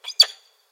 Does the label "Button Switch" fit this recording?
No